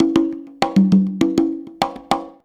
CONGA, Modern Percussion Loops

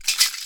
MARAKA SHAKE; Best Service; World Colours

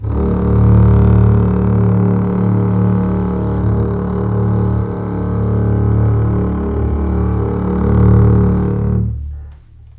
D1 LEG F, String Collection; East West; The Ultimate String Collection

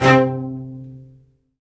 STR BS PIC C4, Vcs Odd Marc

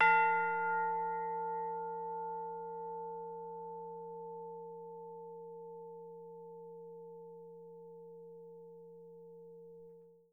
F5, Percussion & Harp; Miroslav Vitous

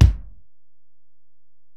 Ac BD, Alesis DM-PRO; 300 Drum Machines

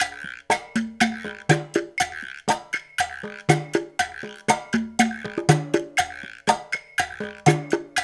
120, African Contemporary; Spectrasonics; Supreme Beats

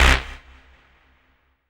RAVE, RAVESYN; Best Service; Dance Mega Jungle Rave

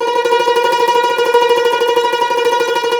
CUMBUS Tremolo

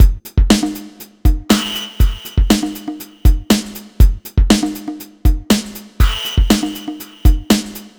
120 Drums; Zero-G; Soundclash Nu Dance Electro; Combi Loops; 120bpm; Agoraphonica

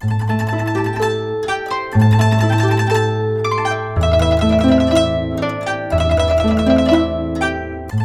GUCHIN 90 C; INIS - Opium

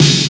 SNR CHEAP, snares; Sn Modules 5; Roland - Rhythm Section

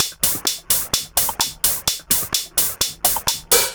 Hats, 128bpm, Nu Dance Electro; Zero-G; Soundclash; Combi Loops; Monofonic

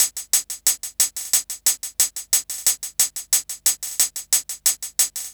Hi Hat Loop; Sonic Foundry (Sony Creative Software) - Fluid Dynamics Drum & Bass; Construction Kit

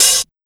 PHAS 130 HH O, Big Beat Drumloops; USB Soundscan vol.25; AKAI

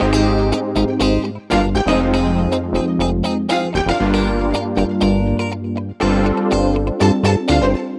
Backing, 120bpm; Zero-G; Nu Disco; Nicengroovey C

